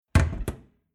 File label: Household Domestic Kitchen Cooker Arga Closing Oven Household Door Single